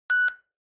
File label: Handheld Transceiver Signal, Tx Beep